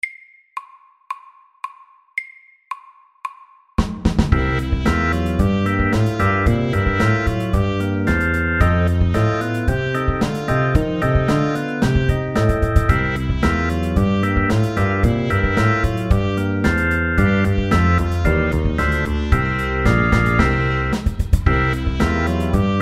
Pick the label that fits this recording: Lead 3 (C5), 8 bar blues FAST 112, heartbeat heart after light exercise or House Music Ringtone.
8 bar blues FAST 112